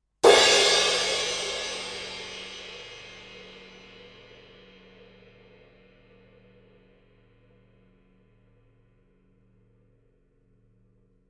becken

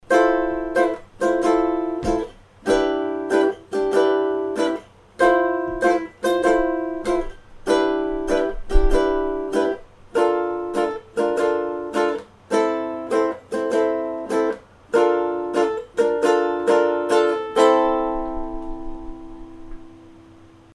Ukulele weit ohne popp; Apogee

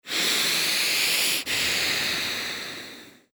Vocal Special Plopp atmer überzogen

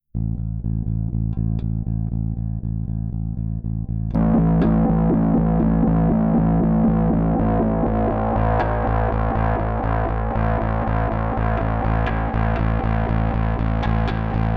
EBS DRIVE voll TONE variabel LEVEL Mitte CLEAN Mitte

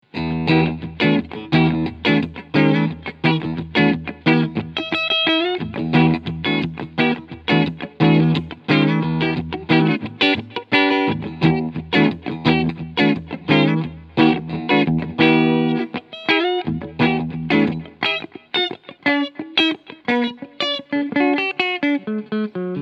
Harley Benton HB-35 Plus Cleansound beide Humbucker Singlecoil

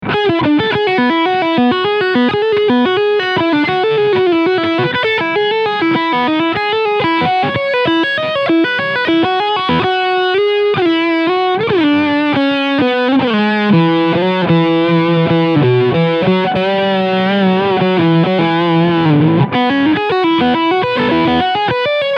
Lead Sound Steg Pickup; Ibanez JEMJRSP PK

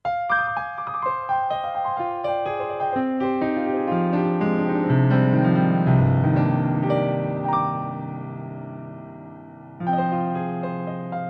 CFX und FM EP

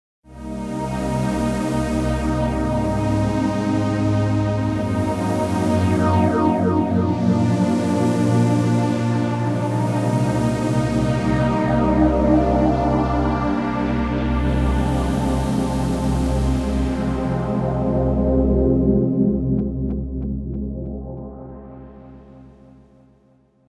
Analog Pad Modell + Filter Mod

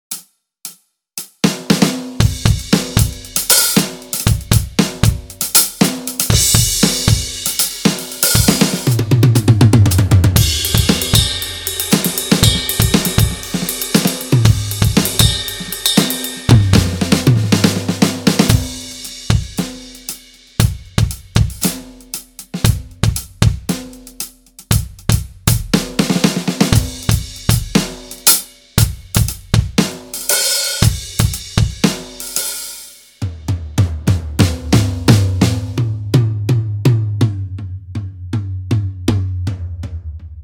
gewa G5 Modul Drum Kit

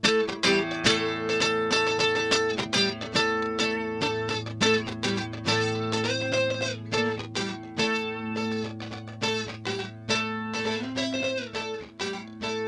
Doubler Gitarre Auto Double; Fix; Magic

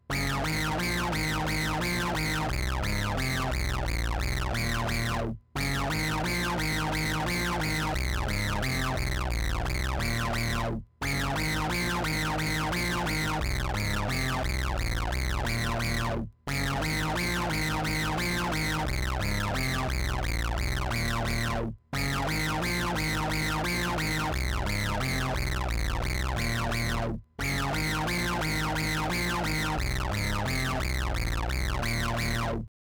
Synth Sequenz; Apogee Symphony Desktop

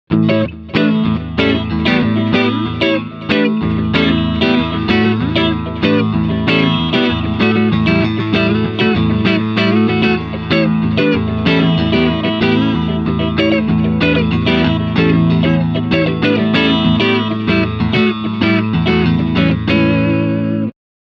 Clean Strumming beide Singl

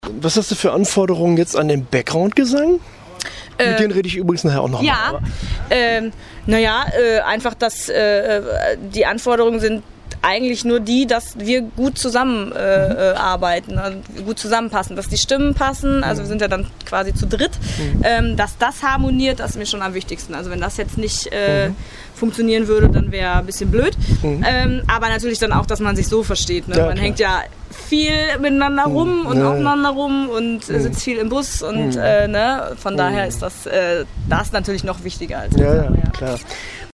Background Gesang

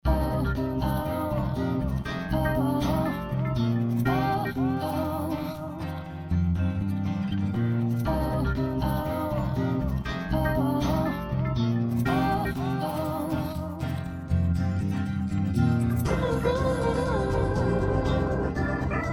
ohne percussion und drums

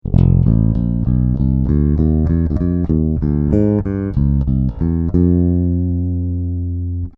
Corvette Hals Seriell

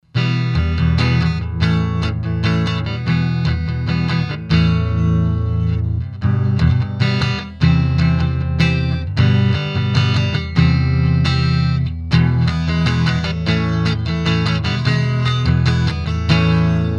E Gtr clean Kick off Boost off; Telefunken M82

